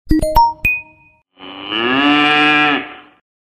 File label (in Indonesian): nada notifikasi wa suara sapi; Suara sapi yang khas dan lucu